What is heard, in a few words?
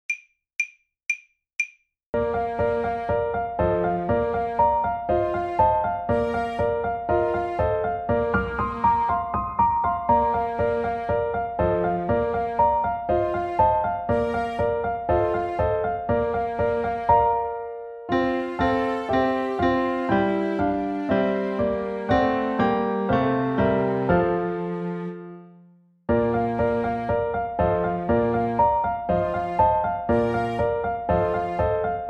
saxophone; mib